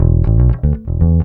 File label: bass loop; AMG; Now CD-ROM (Roland)